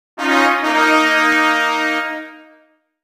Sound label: Jingle Event Bad; Age of Empires The Age of Kings; NDS